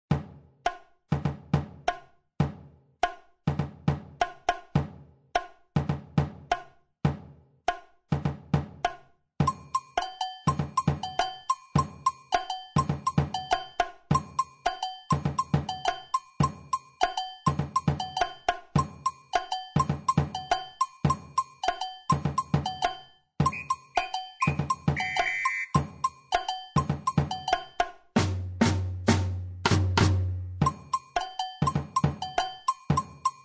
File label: batucada